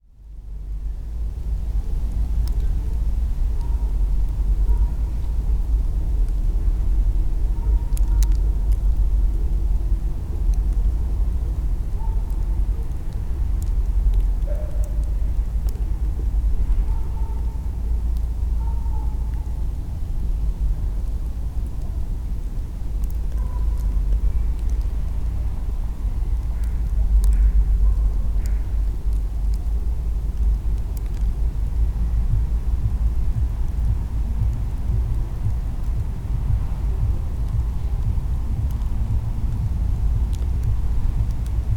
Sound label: crackling leaves, sergiyevposad